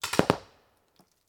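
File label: Paintball Gun Impact; Sound Ideas; Guns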